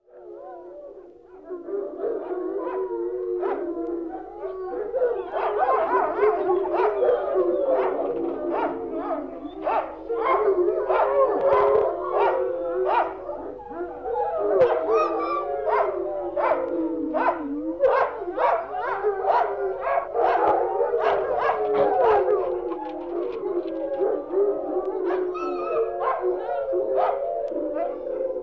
Howling dog pack

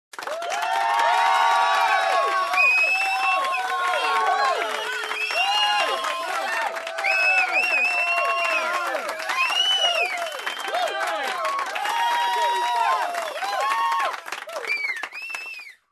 Group Applauding Shouting and Whistling

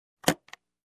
Suitcase Opening locks

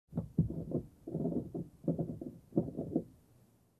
Noises outside of car

